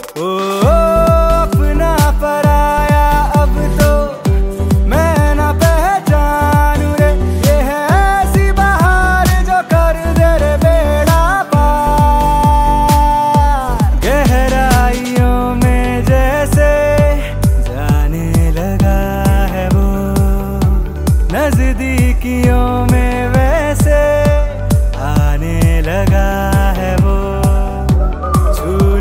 hindi ringtone, bollywood

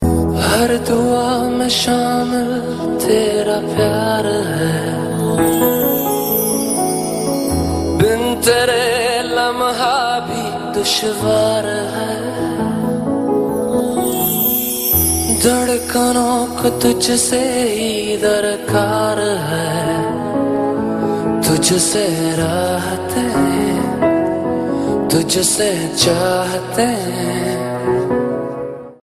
ringtone

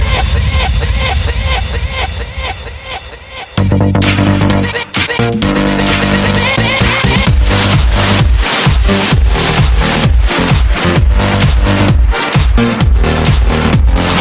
electronic background music

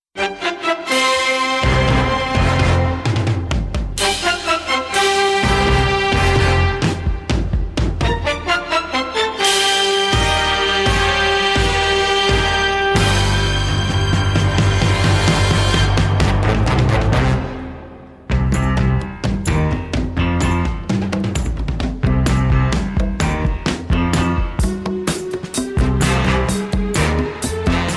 cool background music ringtone for mobile

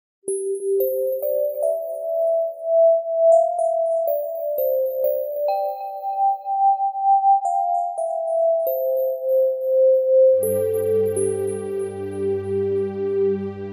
flute love instrumental ringtone

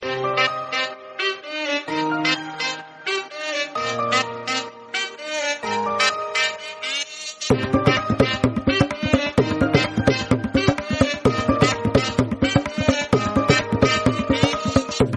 cool instrumental background music tone ringtone

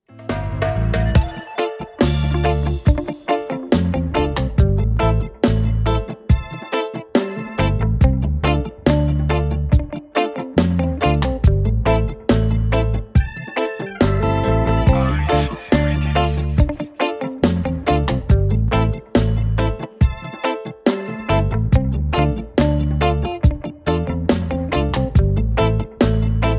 rocking reggae ringtone; samsung

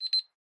timer ringtone

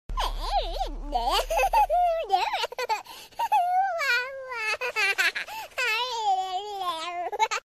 funny girl laughing ringtone

